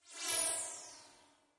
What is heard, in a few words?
Laser High Pitch Whoosh; Scifi Production Elements, Set 1; Science Fiction Sound Effects; Lynne Music